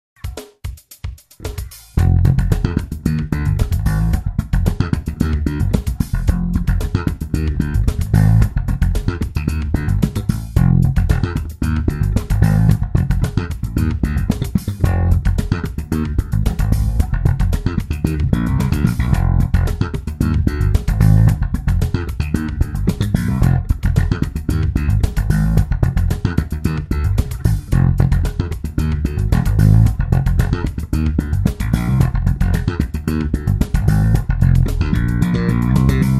Slap line in F7

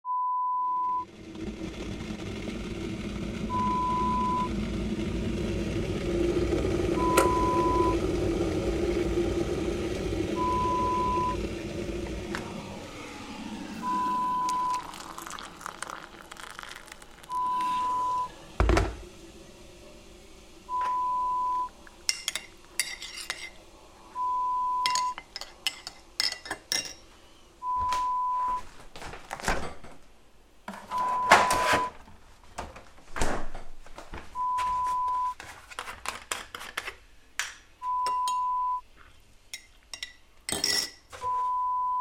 Making Tea Coffee sounds

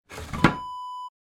sauna vent close

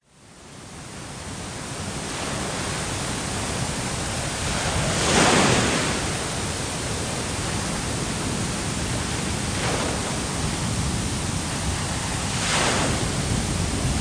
Ocean Waves

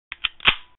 Luger P08

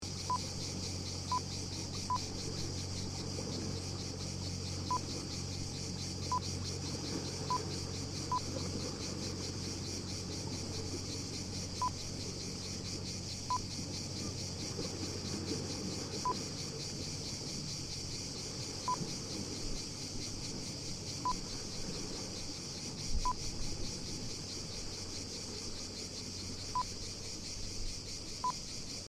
Mediterranean Island Ambience

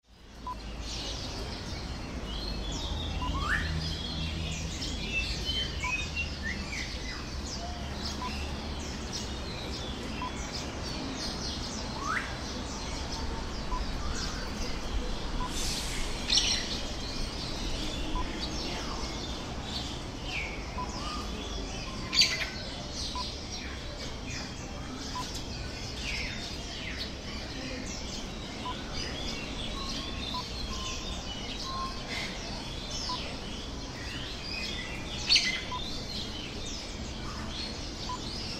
Hong Kong Bird Market Ambience

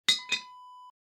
glass cork close

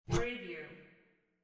cabinet door open

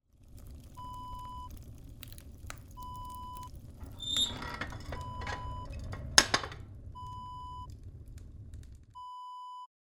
wood burning kitchen stove door close

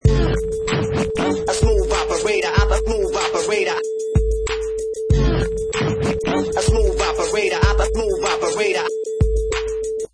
Vinyl Scratch 95 bpm with beat

